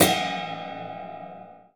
weird uppers, Cinematic Metal Impacts

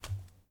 hands on a wall; Apotheorasis

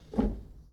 Foosball Center Rotate

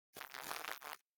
Insect Buzz - Short Fly; Hollywood Edge; sound designer's toolkit v2

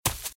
Footsteps Leaves Sprint Right; RadwinIsland

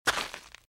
Footsteps - Gravel - Walk - Right; RadwinIsland

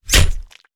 Stab Bayonnet; Sound Ideas; Guns

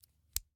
Scissors, snap, snip, shear, small, single; Eiravaein Works; Vaeyan IV

